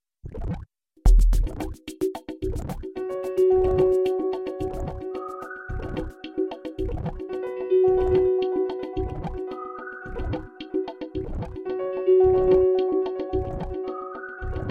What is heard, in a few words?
110 Downtempo Electronic